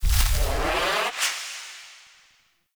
Wyld Blind Cast; Powers; Fey; Saboteur

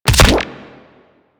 Weapon Punch Shotgun; Warframe; Weapons; Shotguns

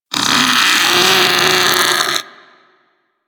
Infested Splitter Top Vocal Death; Warframe; Grey Strain

